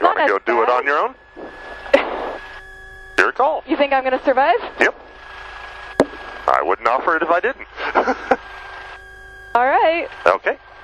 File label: solo begin